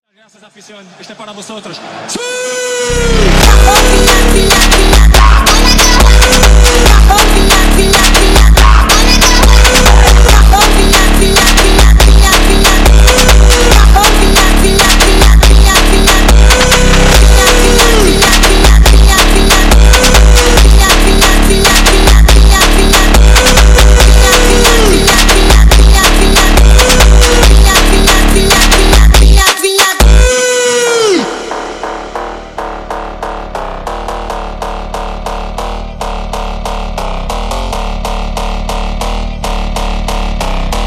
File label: Funk